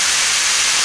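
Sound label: pipe leak small; Star Wars Knights Of The Old Republic II; XBOX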